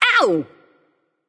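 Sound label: ouch, female; smite; voicepack; hera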